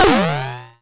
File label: SYNTH SIREN; E-MU Formula 4000 Series Vol. 2